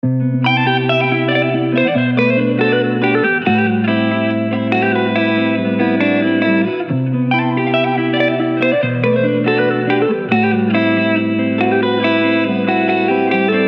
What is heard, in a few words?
140 BPM G; Dazzling